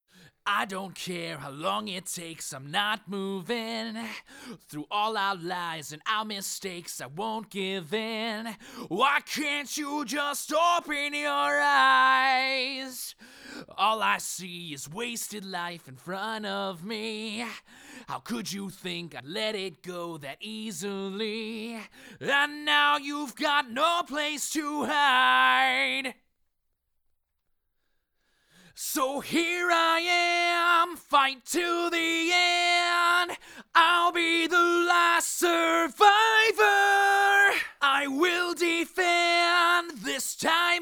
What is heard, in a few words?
M Solo; Slate 251